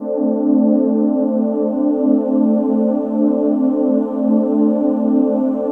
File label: D&B Pad; neuro